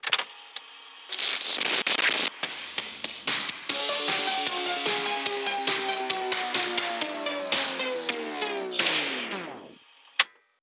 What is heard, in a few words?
The Door Is Opened; maize original soundtrack 2016